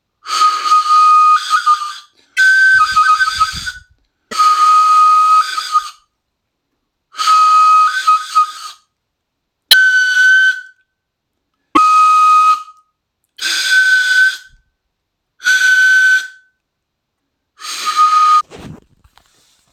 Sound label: flute Burkina